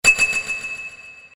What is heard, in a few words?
Ground Smash; Crosshair Triggered; Ben 10 - No Arm Done